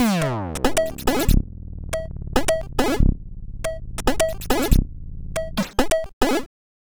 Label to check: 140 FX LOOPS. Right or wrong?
Right